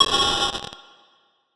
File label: Glitch Sound